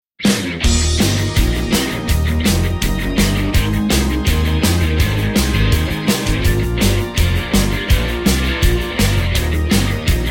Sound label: Eb No Backing Vocals, backing tracks